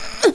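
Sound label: climb; Tomb Raider PS1; lara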